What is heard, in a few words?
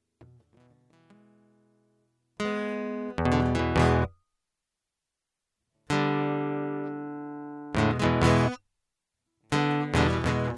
Instrumental